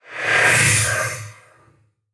ancient game dark magic whoosh spell fantasy tonal accent hit stab